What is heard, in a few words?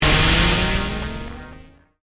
Hit Synth Sweeper, Ascend, Low; Production Element; BlastWave